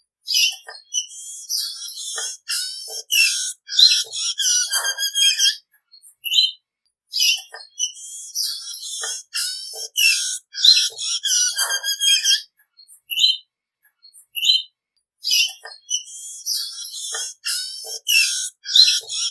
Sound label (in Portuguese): Canto do diamante sparrow